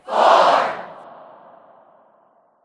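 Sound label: Ambience Tennis Crowd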